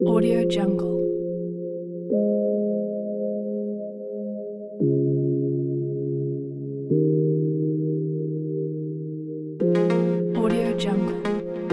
short technology

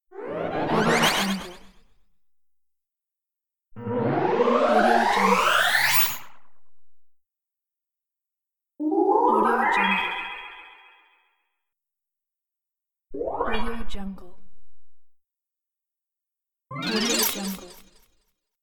removed vanish disappear

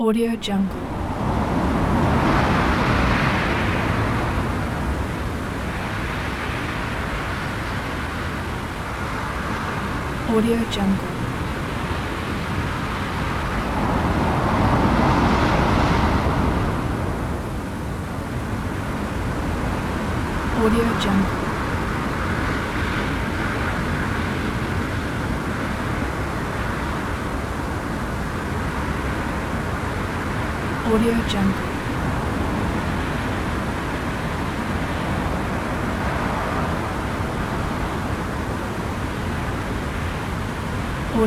wind

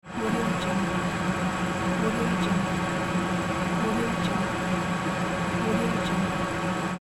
ventilation air stream

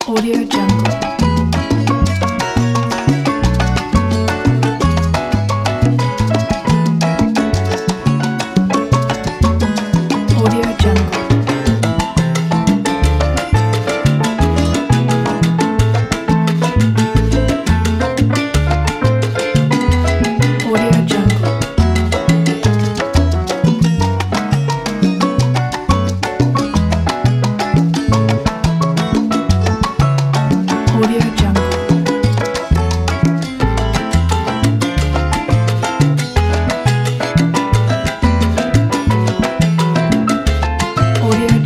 the latin cuba

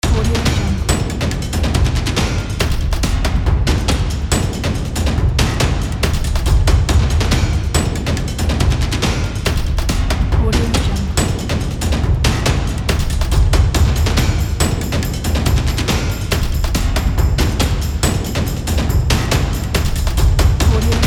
cinematic drums; andromedasound

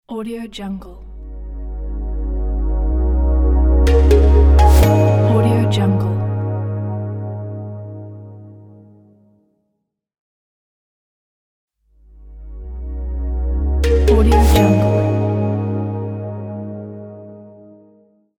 logo reveal

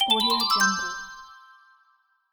the win; blackdragonfx

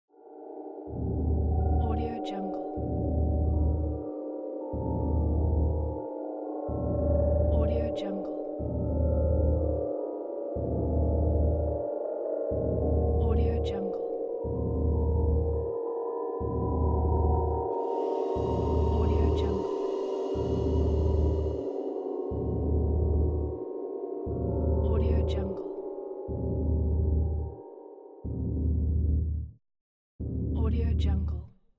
psychedelic ambience

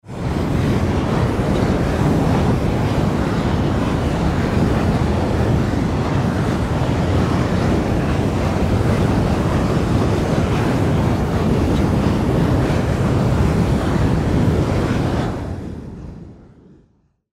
fire whoosh loop

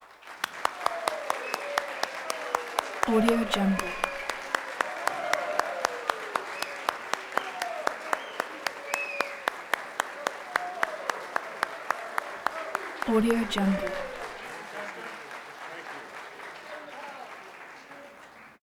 crowd applause

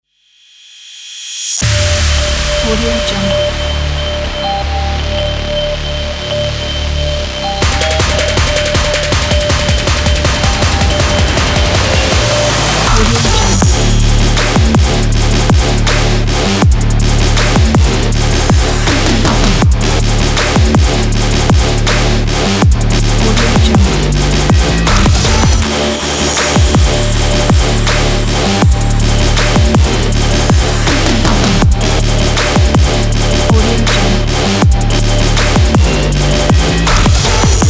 the workout trap beat

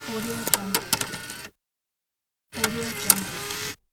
cd player eject

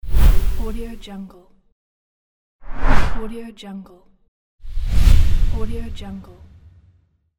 whoosh

